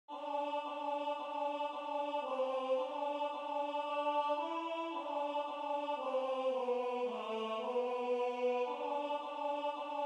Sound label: Alto